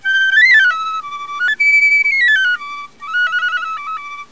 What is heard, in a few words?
flute wa; instrmnt; ClickArt 300,000